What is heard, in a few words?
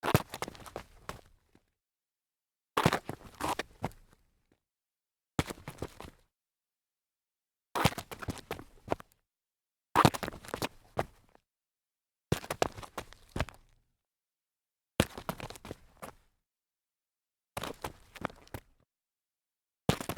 sneaker stumble side concrete, foley